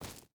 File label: footstep barefoot gravel run; Rust